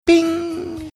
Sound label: bing